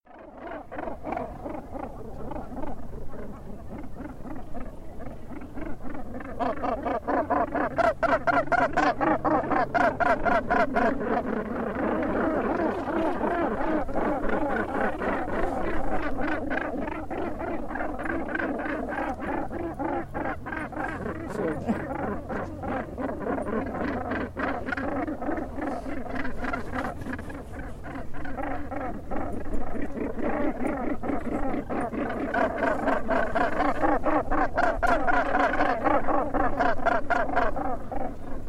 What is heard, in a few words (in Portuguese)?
Canto da alcatraz